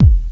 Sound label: kick, drums; awe32